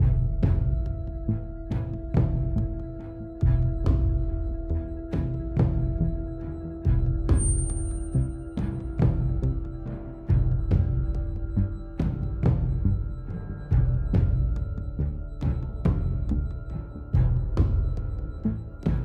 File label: loop Tense